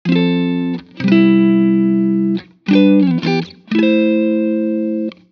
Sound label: EG Dry